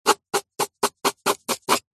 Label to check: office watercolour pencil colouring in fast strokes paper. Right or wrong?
Right